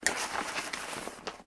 laptop case movement; foley

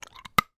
office plastic pen lid chew and crunch in mouth

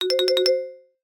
comedy marimba flutter or shake short low pitch; sound effects one

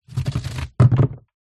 household chair plastic patio drag ground drop

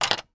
electronic game plastic body part put in cavity; operation

